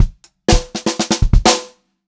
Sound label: 120 pop fill, Pop-Rock Drumloops; USB Soundscan vol.37; AKAI